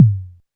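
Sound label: technox bass drum; Quasimidi Technox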